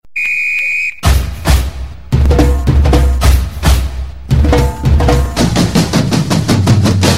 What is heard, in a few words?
Drums Sms